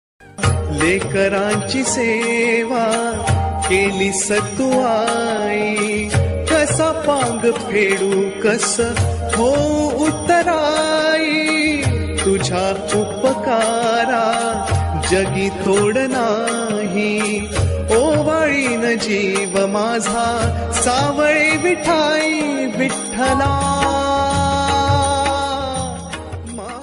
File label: ringtone marathi; Vitthal